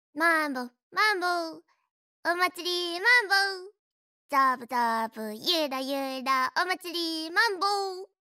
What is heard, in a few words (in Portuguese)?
music mambo sem musica de fundo